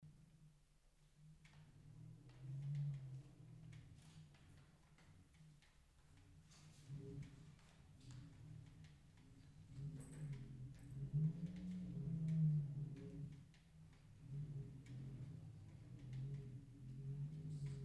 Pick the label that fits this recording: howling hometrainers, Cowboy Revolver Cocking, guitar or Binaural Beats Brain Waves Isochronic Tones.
howling hometrainers